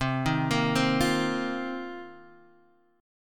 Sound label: F 7th Suspended 4th C